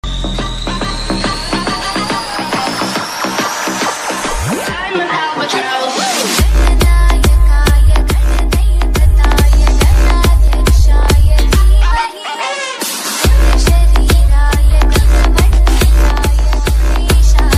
ganpati bappa morya ringtone dj remix bgm ringtone